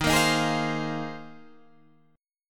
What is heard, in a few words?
Bb 7th Suspended 2nd Suspended 4th Eb